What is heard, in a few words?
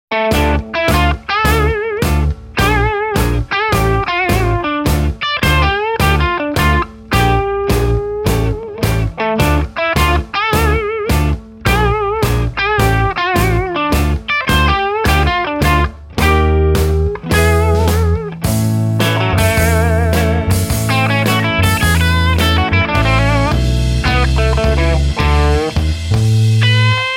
blues; Guitartechniques